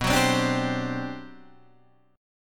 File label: G Major 11th B